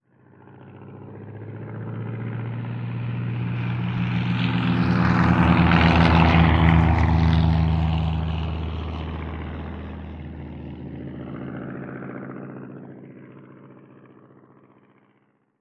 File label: Monoplane Pass Short; the 39 steps